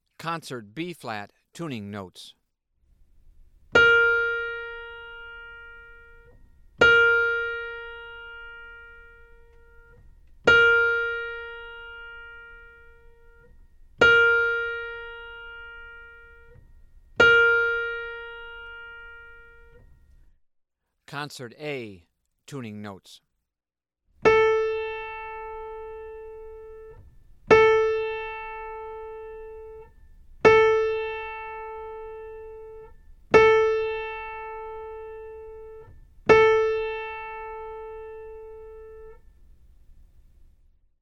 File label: Tuning Notes; James „Jamey“ Aebersold Play-Alongs